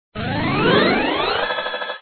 bigtop raising Alarm

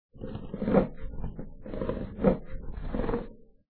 grinding

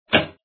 enchanted wooden clunk

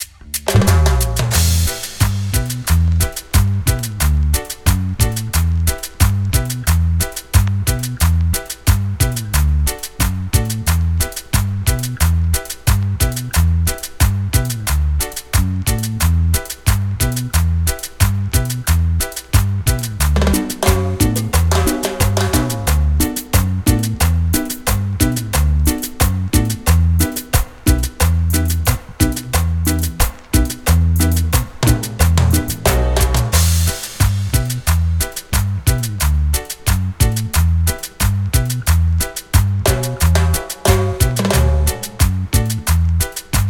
La Calle Cumbia

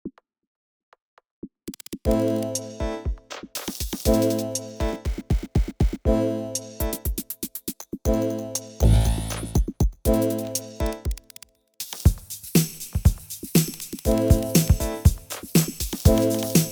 Freak Funk

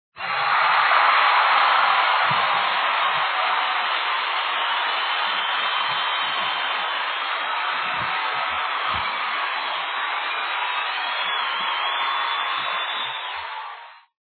idol cheer; Quicksilver

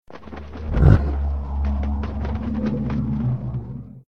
Monster Long Growl

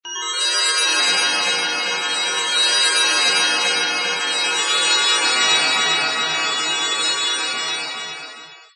Magic House Magic Ball

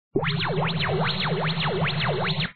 comic warp wubbles; Quicksilver

